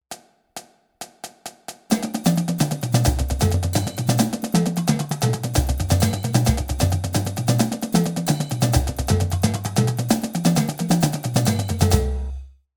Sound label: Chuggeda Pataflaflas 132, drumline